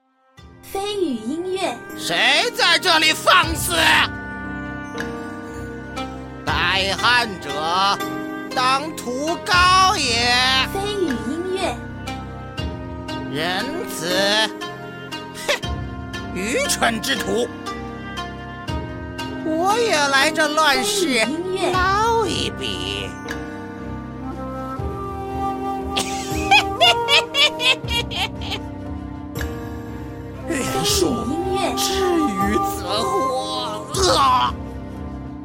抒情 忧伤 沉重

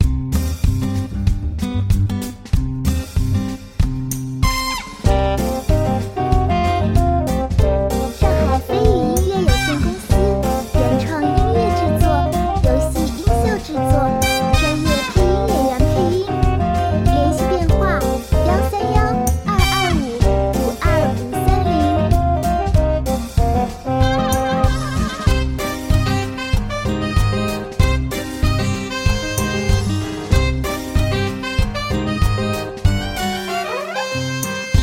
爵士轻快; 豆子世界; 决战

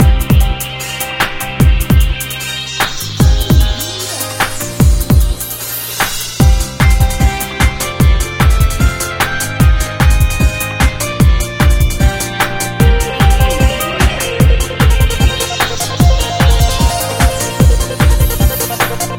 登陆背景音乐

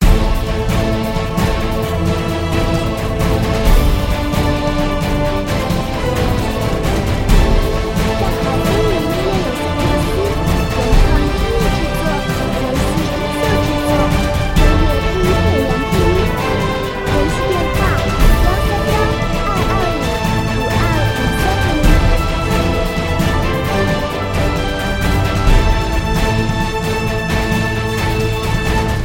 大气史诗战场-战斗

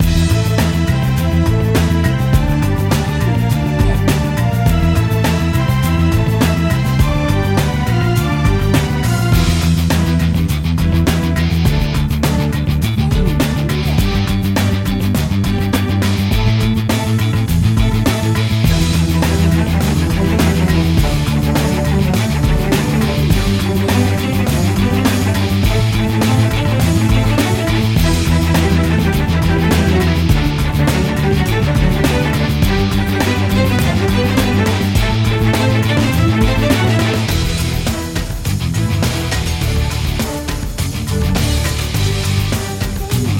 战斗

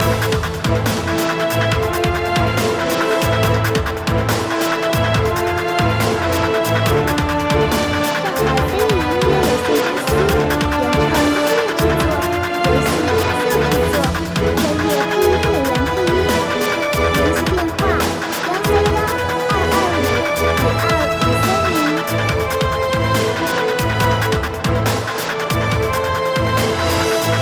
紧张激烈; 信仰之役

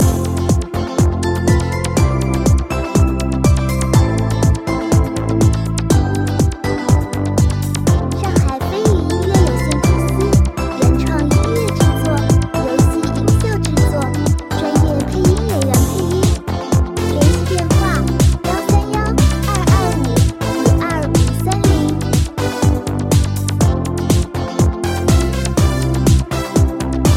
电子-欢快-休闲-日系

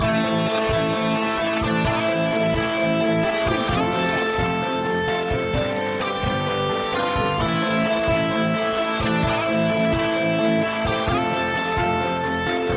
BOSS战背景音乐

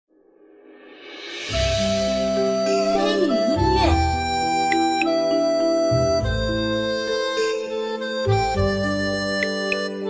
杭州-城市-市井

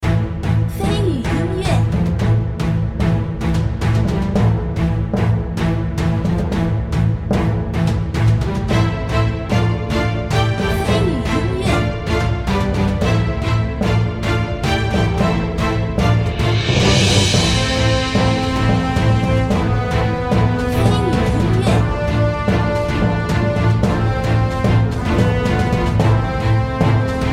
海战

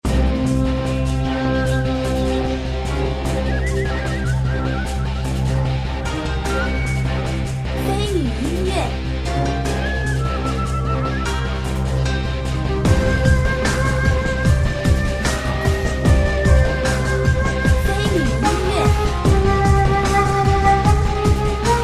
危险 压迫

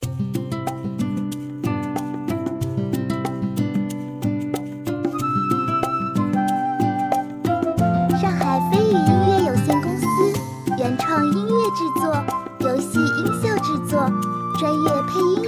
Q版舒缓休闲; ShopMode

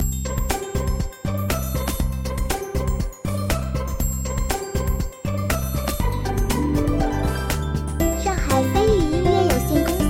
轻松-节奏-主界面-日系

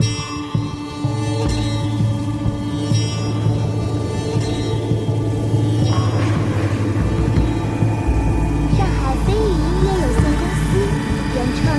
危险诡异; 远方的绿洲

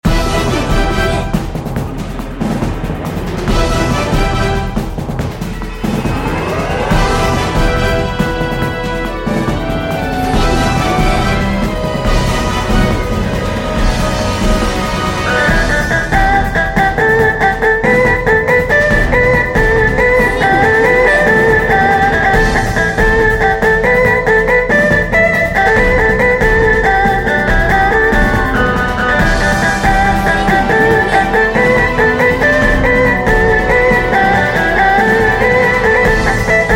boss 战斗 激烈

